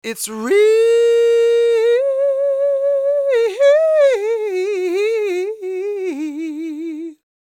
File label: SING W, Vocal Planet Blues & Soul, BLUESY; ILIO